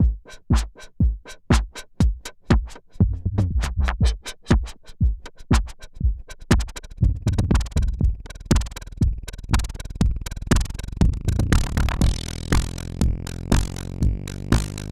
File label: Keyboard Drumloop, Filter LFO; DSI